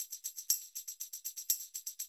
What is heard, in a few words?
120, Classic Drum Loops; Best Service; XXL 1500 Classic DrumLoops; Sample CDs